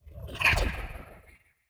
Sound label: woosh